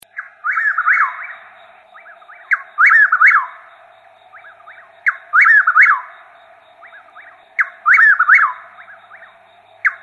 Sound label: chuck wills widow